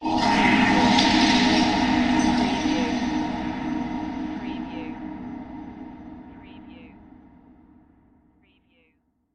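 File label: Production Element Swell Scary; Sound Effects Bible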